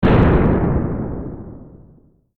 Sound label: ship explosion